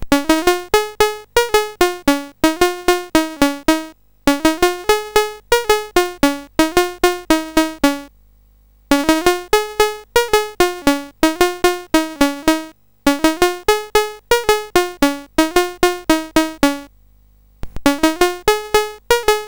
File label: BASIC sid CSG8580R5; Oh Suzannah